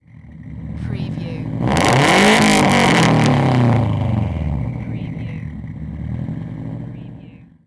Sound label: SUBARU STI EXHAUST SINGLE REV